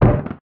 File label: wooden collision dry dull; FMOD; Interactables